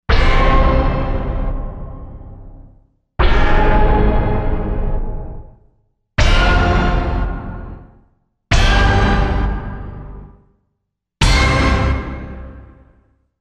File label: Impact